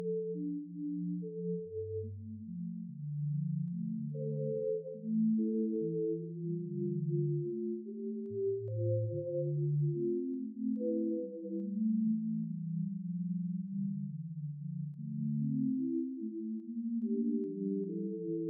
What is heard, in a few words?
midi playback; scarbrofair